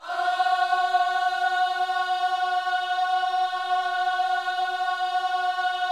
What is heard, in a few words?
OHS F#4A, Gospel Ohs; Spectrasonics; Vocal Planet